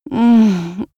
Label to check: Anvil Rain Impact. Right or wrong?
Wrong